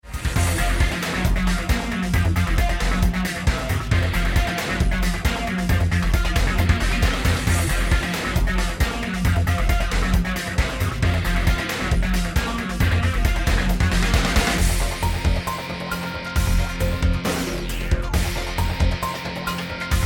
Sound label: New Rock Guitar